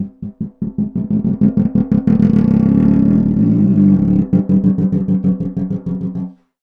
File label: Snare Drum; Percussion Samples